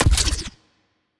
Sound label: evasion debuff projectile, weapons; Stellaris; biogenesis